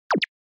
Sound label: Anime Motion Low